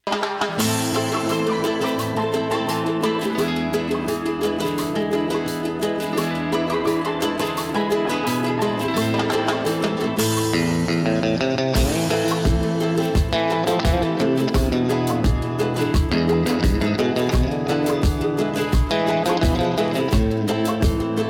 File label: rumba